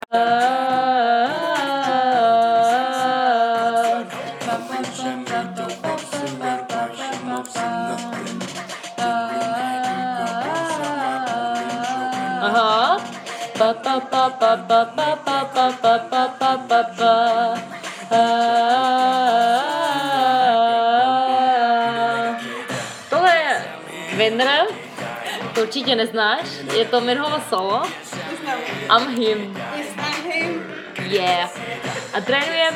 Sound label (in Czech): intonace a staccato